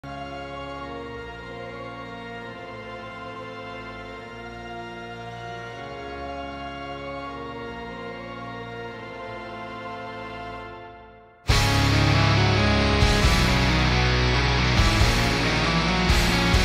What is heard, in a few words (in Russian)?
эпичный power metal; ai cover